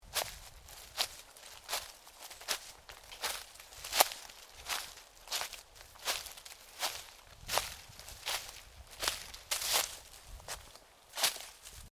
Footsteps forest, Footsteps: walking through forest, dry undergrowth